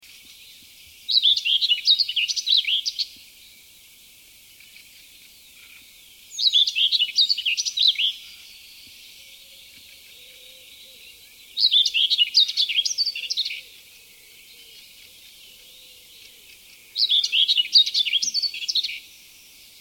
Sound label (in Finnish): pensaskerttu; laurinmaki